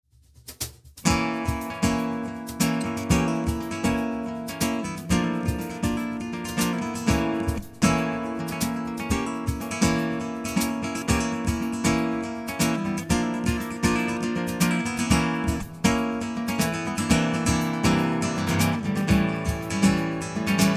Konzertgitarre Flamenco; Easypick EP 1; ohne EQ Stereo

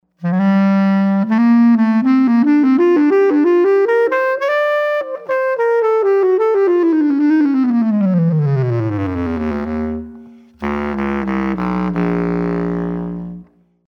bassklarinette

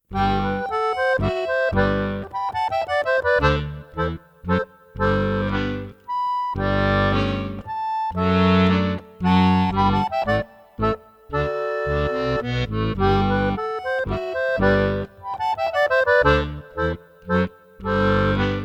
Klassik

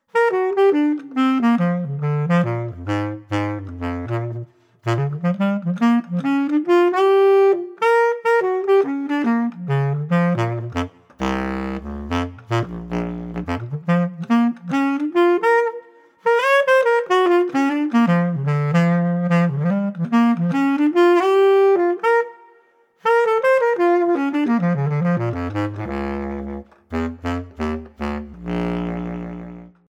bassklarinette hall narrow

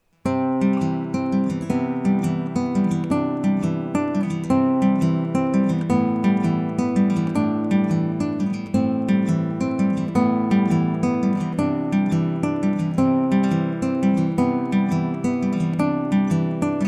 Konzertgitarre Easypick Pop ohne EQ Mono

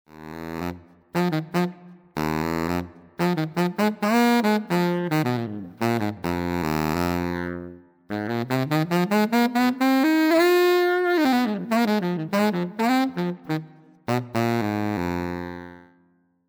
Pickup Baritonsaxophon; Einbau-Tonabnehmer für Holzblasinstrumente; Sounddemo

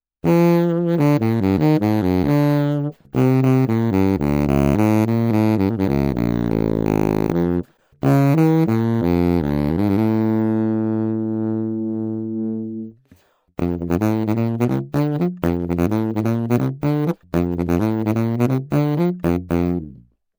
pickup bass saxophon; Einbau-Tonabnehmer für Holzblasinstrumente; Sounddemo

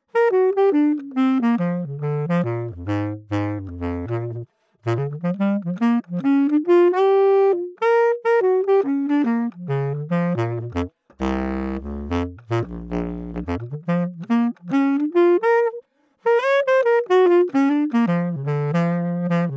Bassklarinette

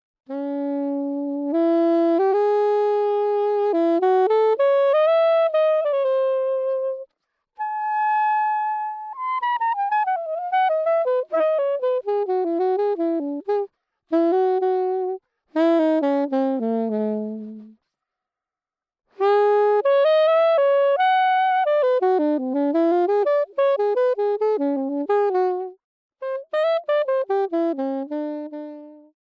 pickup sopran; Einbau-Tonabnehmer für Holzblasinstrumente; Sounddemo

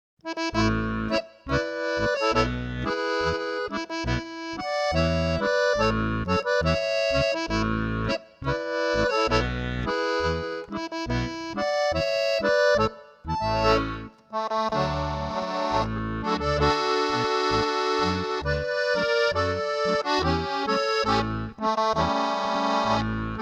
Volksmusik

